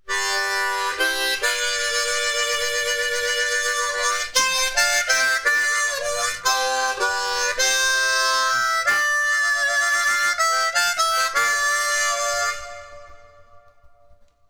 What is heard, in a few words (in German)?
harp Blues